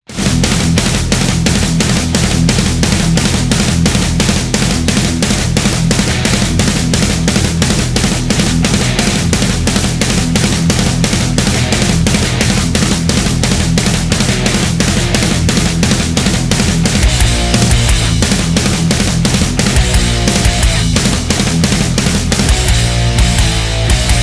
moschnoe zvuchanie barabanov

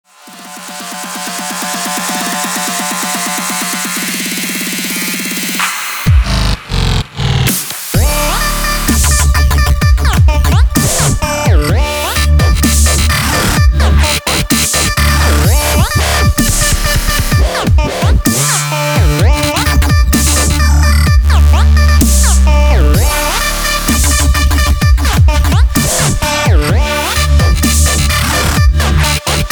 dambuka neveroyatnye zvuki v dambuka

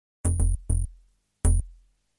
standartnoe sms soobschenie apple iphone

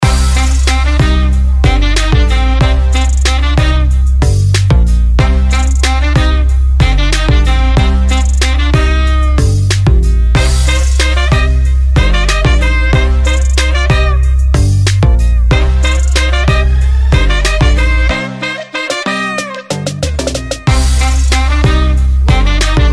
balkanskiy rumynskaya kompoziciya s melodiey

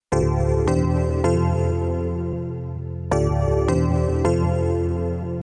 korotkaya narezka iz treka na soobschenie ili uvedomleni

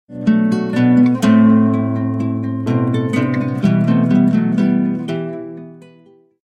romantichnaya sms krasivaya melodiya sms

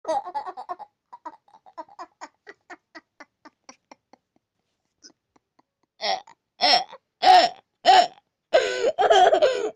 smeh rebenka pozitivnyy smeh rebenka; na zvonok

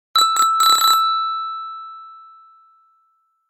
velosipednyy gudok rington sms velosipednogo gudka

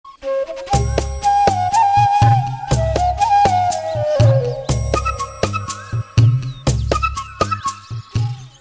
sms dudochka

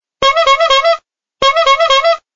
bibikalka zvuk signala na staryh avto

